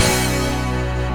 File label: ORCHESTR HITZ